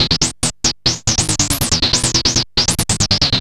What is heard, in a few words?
Treat Guit, Industrial Nasty